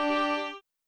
Violin; backpack hero gamerip 2022

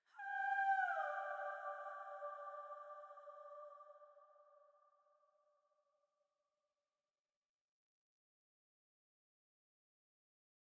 Forest

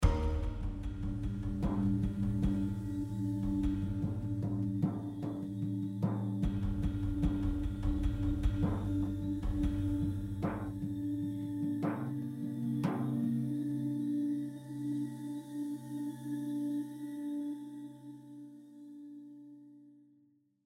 Drums Metal Harp Hits; The Last of Us

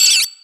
Battle - Crown Loss; Mario Kart 8

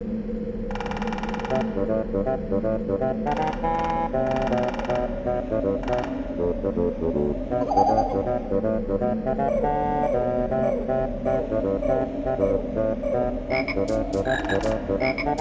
Autumn (with Ambience); Banjo-Kazooie; Click Clock Wood

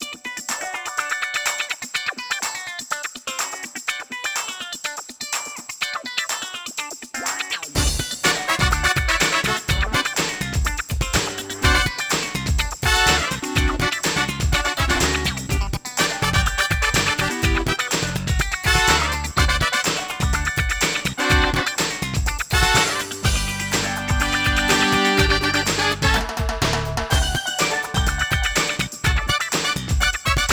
Tennis - Normal Court; Super Monkey Ball 2

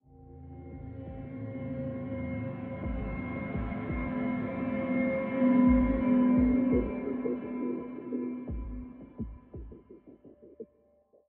Military High 85bpm

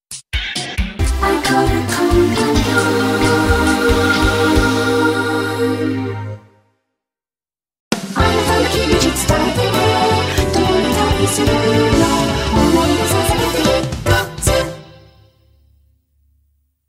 Jingle Electronic; Terra Battle

